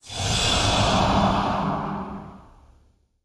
Spawn To Hand Sound; Archmage Arugal

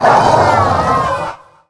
Hunting Mastiff, Death

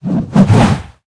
Totem Weapon Underlay Play

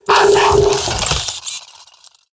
Attack

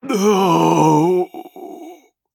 Death

